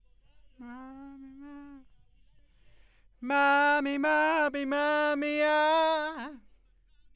B. Vox

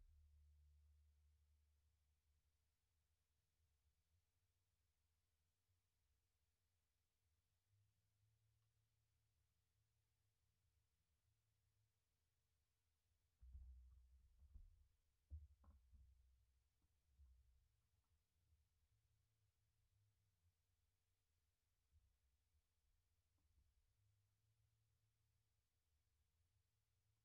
Sub

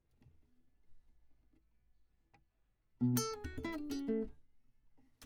Classic Guitar

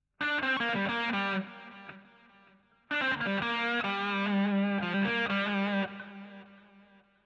GTR A Solo

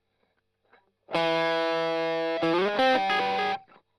Strum Line

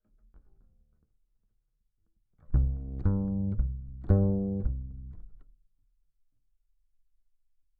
Bass DI

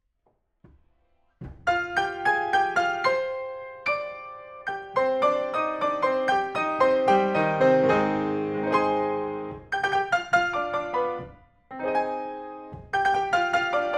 PIANO; TANGO

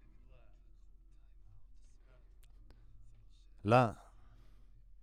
Voc OD

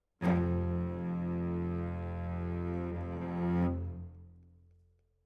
Cellos

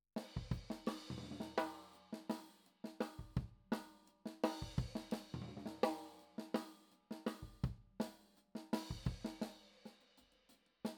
KIT

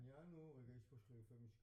Piano B

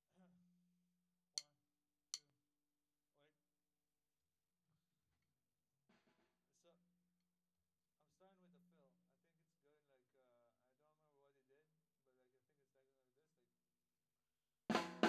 Floor

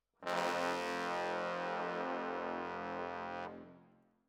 Brass

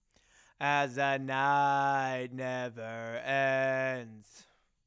Chorus

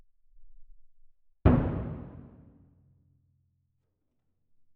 Perc

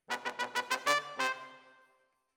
Brass Over

